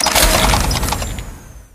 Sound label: rocket pack boosters retract; tf